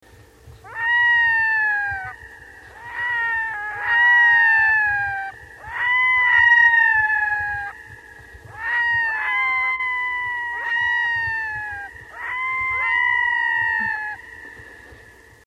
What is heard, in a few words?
WAIL DUET, loon